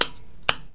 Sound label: click clack